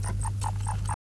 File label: Treeshrew pos Clicking